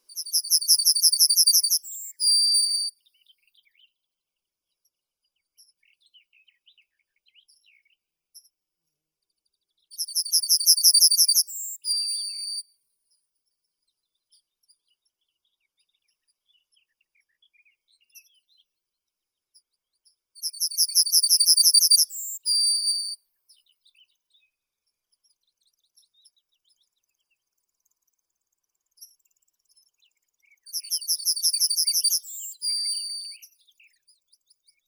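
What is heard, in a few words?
yellowhammer, Emberiza citrinella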